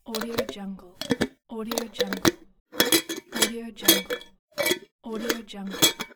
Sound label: opening and closing a jar